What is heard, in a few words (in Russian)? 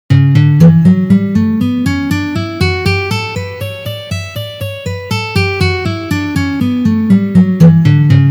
Gamma Si minor pozitsiya